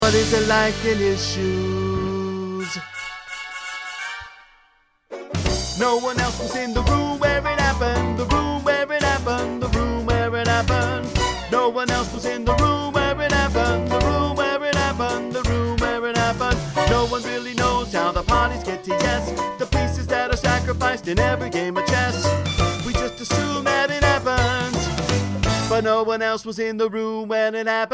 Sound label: bass